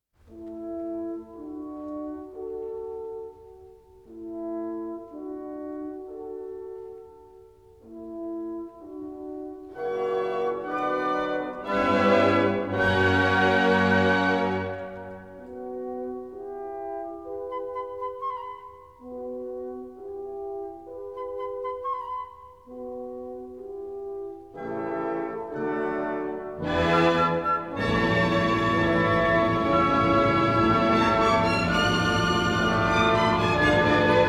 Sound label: Violin Concerto in B minor, Adagio; Paganini